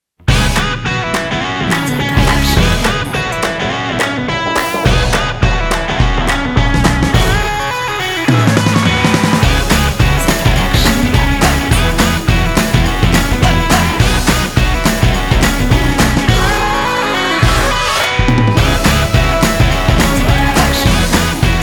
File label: Positive Energetic Indie Rock